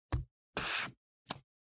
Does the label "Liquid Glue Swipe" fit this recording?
Yes